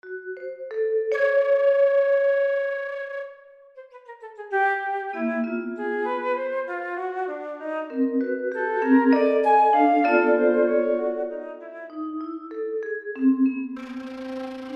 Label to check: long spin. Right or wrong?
Wrong